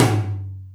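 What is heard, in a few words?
Md Plate, Alesis D4 drum machine tom; 300 Drum Machines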